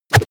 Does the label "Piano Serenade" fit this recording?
No